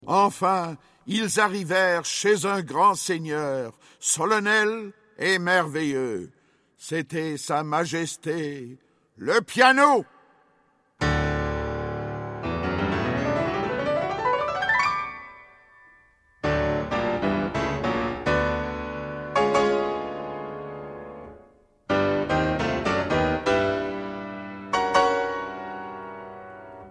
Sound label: piccolo saxo piano